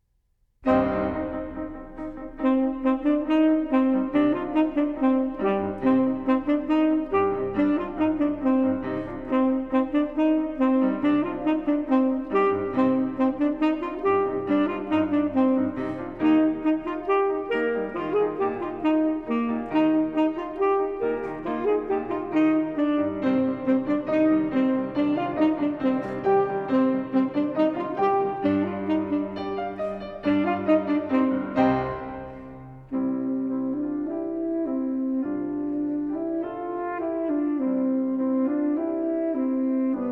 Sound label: TEN SAX; ensembleworks